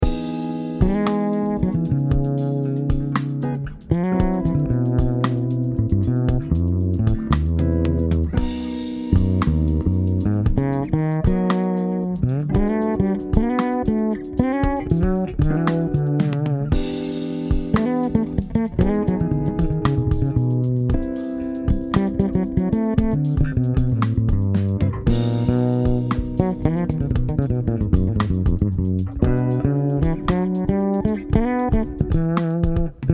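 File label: Fodera Emperor Fretless, bass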